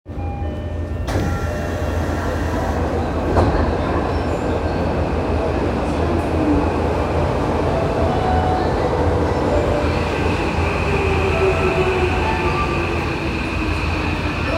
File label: train sounds; 日比谷線秋葉原南